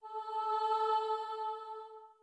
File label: starting note, voice; quick